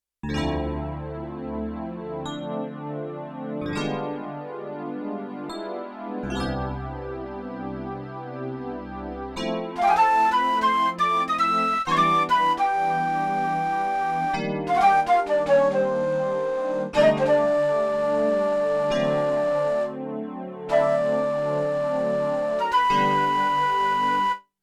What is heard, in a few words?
Carpet Flute Split; Yamaha TX16W; Big Band Performance